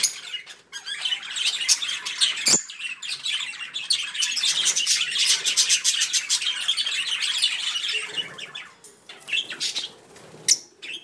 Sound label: budgie